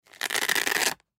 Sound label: Laptop Case Front Pouch Open Slow Velcro; Ripping; Office; Blastwave